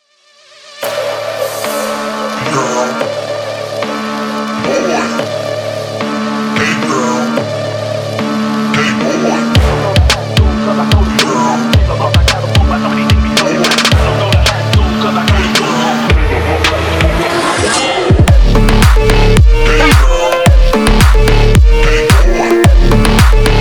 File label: Phonk